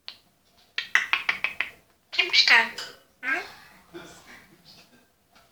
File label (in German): kim step, Soundeffekte